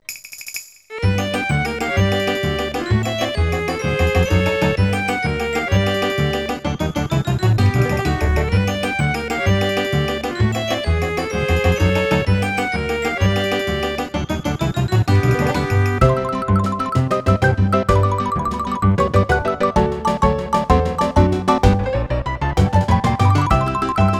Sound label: Spinning Polka; mario party 2